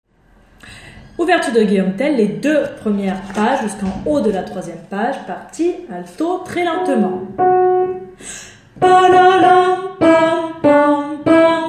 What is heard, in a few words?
Alto; G.Tell